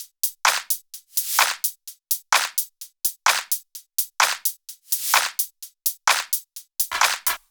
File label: Loops 128BPM, Drumloops